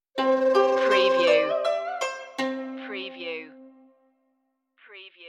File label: chinese waltz stinger